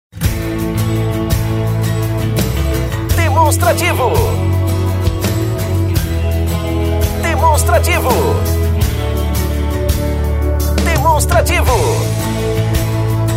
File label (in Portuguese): tema espera